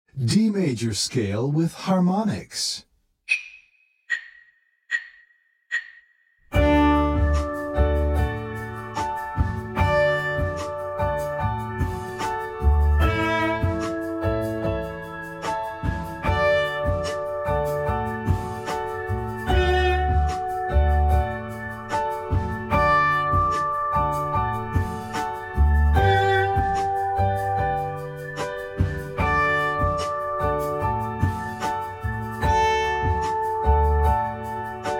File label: D Major Scale Harmonics